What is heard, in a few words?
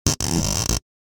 Plastic squeak and creak; gfxsounds